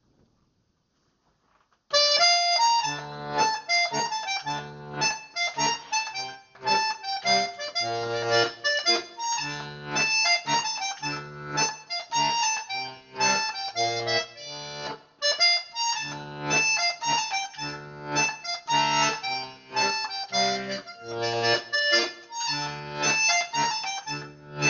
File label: Mazurka; de Chez Colette